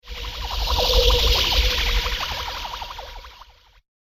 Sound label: Alien Wash Flyby; Alien Sound Effects; science fiction sound effect; Andrew Fleming